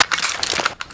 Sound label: kriss reload clipout